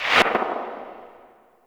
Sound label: UI Focus Enter Tree; DE; Warframe